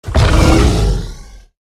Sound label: Attack; Shadowborn